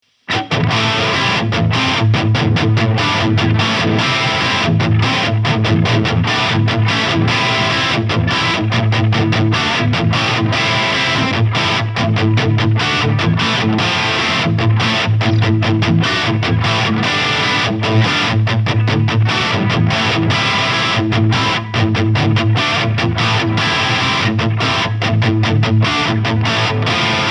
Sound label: Warbird Crunch; ESP Will Adler